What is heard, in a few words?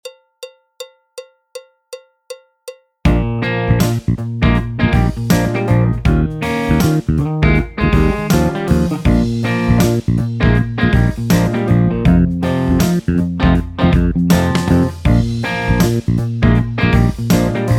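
backing track, Jazz; clarinet, flute; Jazz Syllabus Level 4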